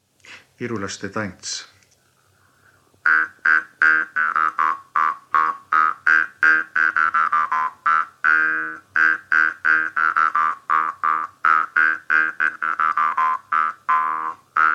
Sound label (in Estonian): Virulaste tants Viru-Nigula parmupill folk